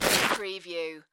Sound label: Snow and Ice, Ice scrape small